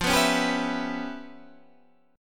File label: Db 7th Sharp 9th Flat 5th F, chord shape